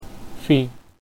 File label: Fish